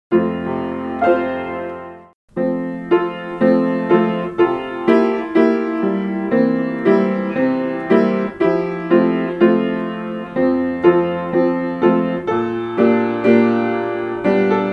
ABCDEFG Jesus, piano; A-B-C-D-E-F-G Jesus bled and died for me

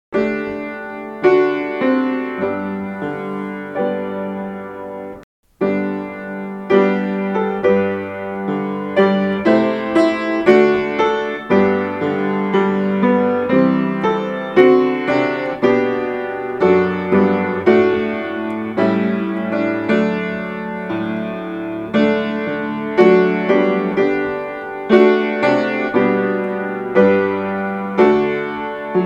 And Can It Be, piano; And can it be, that I should gain, An interest in my Saviour's Blood

